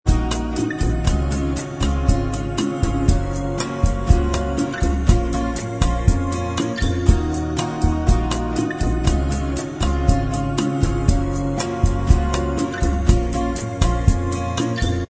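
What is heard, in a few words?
bossa style instrumental Track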